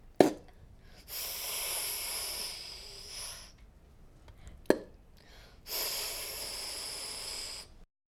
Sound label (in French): Bouteille De Champagne